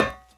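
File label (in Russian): тихий одиночный металлический стук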